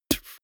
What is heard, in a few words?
beatbox bass drum dull